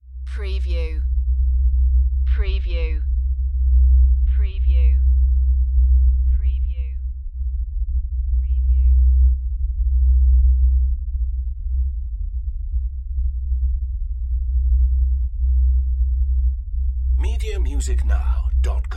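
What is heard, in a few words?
Production Element LFE Rumble; Sound Effects Bible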